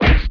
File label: punch; BESTSHOT; noisybit